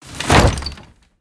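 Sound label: wood double rough mine close, door; wood double rough 02 mine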